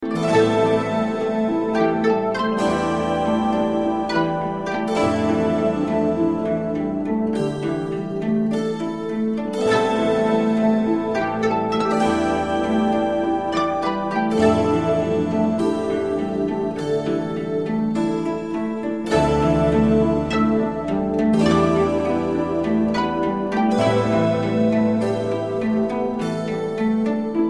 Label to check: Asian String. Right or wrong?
Right